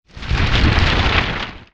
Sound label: GF Lava Rumbles; gnarled forest